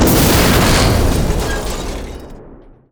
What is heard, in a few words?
ships destroyer attacked; Hearts of Iron IV Death or Dishonor